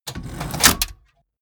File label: mechanics small snap